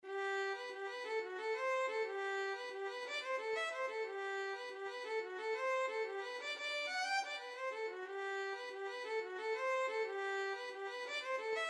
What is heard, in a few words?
rolling jig violin